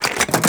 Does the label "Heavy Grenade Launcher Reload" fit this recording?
Yes